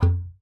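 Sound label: F3, bass flute; Xsample VOL-2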